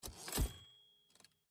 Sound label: case open fast